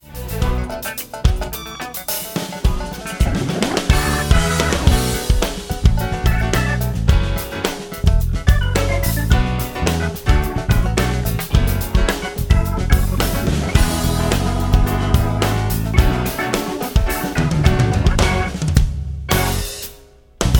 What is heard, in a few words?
Accompaniment